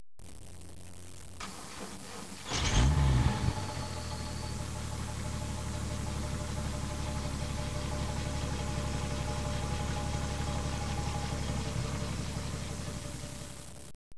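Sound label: TRAFFIC, car sounds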